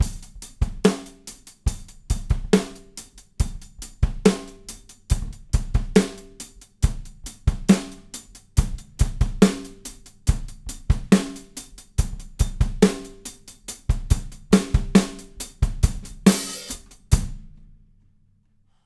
Funk Kit Loop, acoustic studio drums

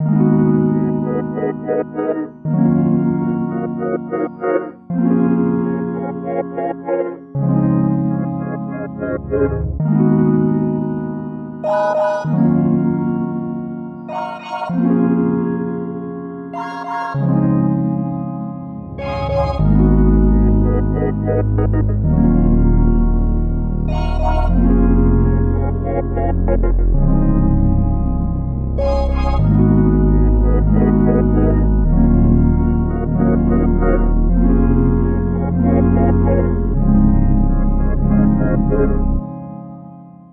Synth 98bpm Dm, reggaeton

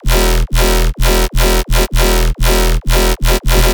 Bass Loops 128BPM E, modern bass house

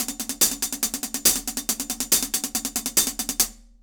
Hi Hat Loop, acoustic studio drums

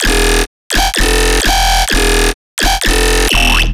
Bass Loops 128BPM E, modern bass house

